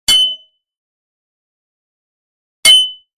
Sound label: Sai (Psi) Hit; Hollywood Edge Martial Arts and Human Impacts